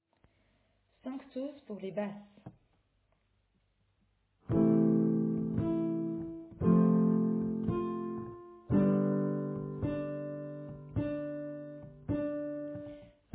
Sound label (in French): Sanctus Basse